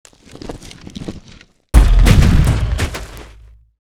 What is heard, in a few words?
COLUMN CRASH